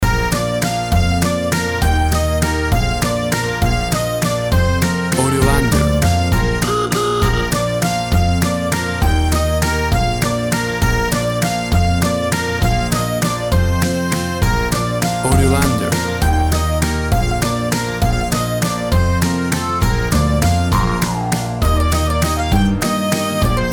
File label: Toddler Music